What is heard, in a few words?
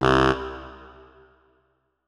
notes, saxophone; Hideout Furniture